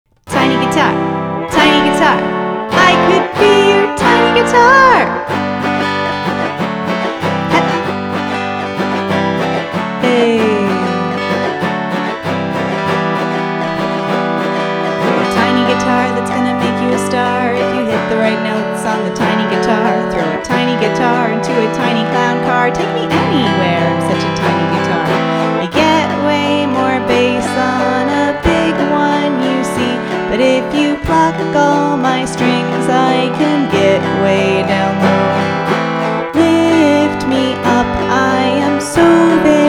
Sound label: Tiny Guitar